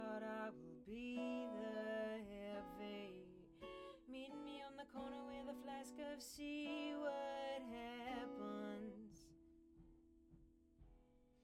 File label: Act Guit R